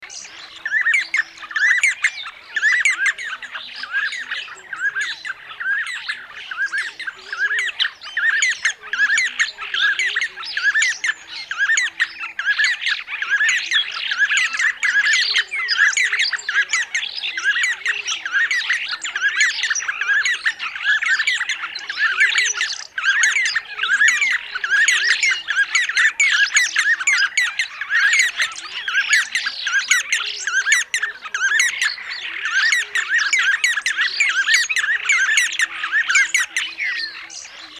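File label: d'Arnaud's Barbet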